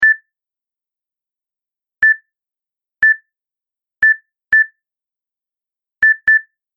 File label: Scanner Beeps Barcode Reader; Beep Sound Effects